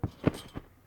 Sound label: trampoline realistic